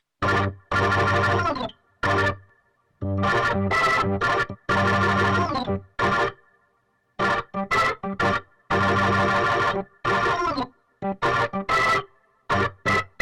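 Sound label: organ, Hybrid neural guitar overdrive; souldriven; Morphdrive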